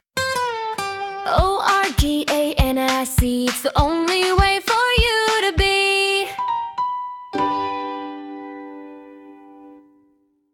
O R G A N I C Its the only way for you to be FEMALE, jingles